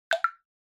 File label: Water Drop Low Dry